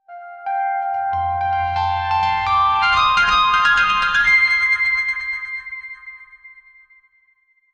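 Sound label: uplifting background music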